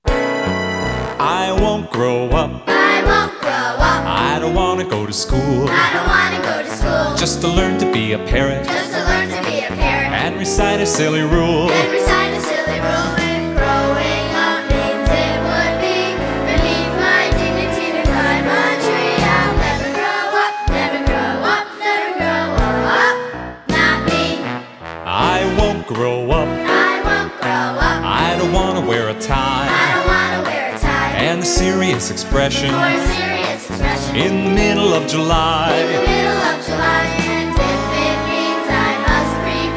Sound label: Karaoke Version Teaching Vocal; In the Style of Peter Pan